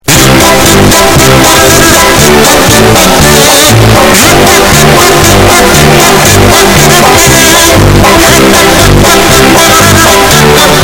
kazoo for yoo distorted longer vesion

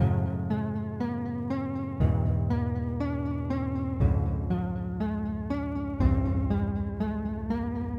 a detuned arpeggio

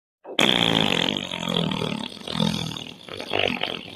fart

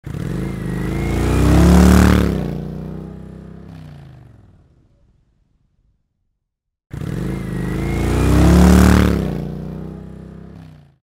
motorcycle revving in highway

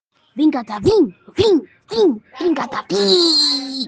vecaving ving ving vecadaving

